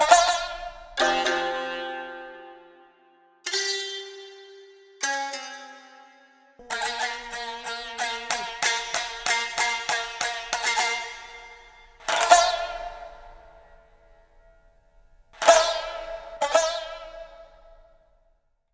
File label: Biwa; Amedama Demo